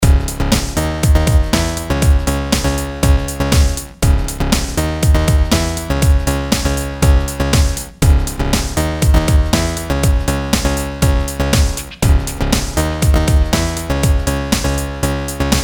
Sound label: Progressive House; Big Fish Audio